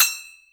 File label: needles, Ticks, Misc Percussion